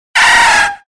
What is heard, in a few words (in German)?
Ruf